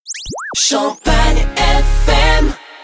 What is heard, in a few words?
jingle; champagnefm